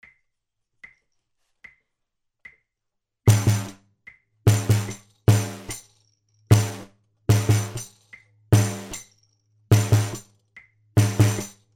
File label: BASS